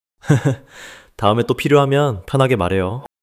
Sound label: laughter